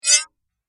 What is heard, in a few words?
Cold Digital Sheen Whoosh